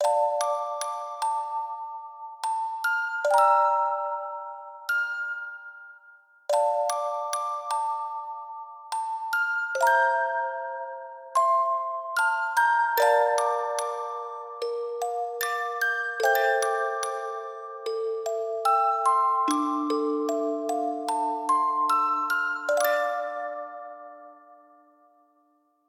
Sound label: musicbox